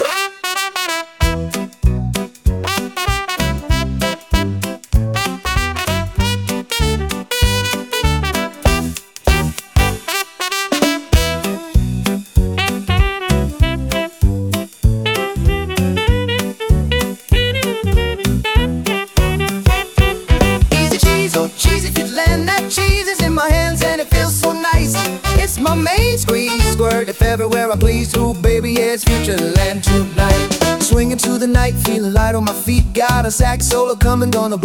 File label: Cheesy Swing; Futureland